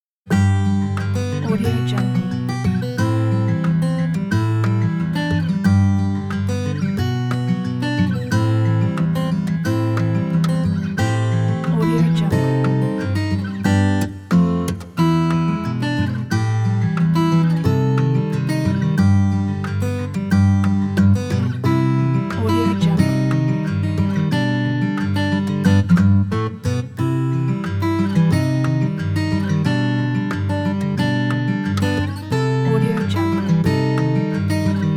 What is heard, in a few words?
latin romance; alexpaul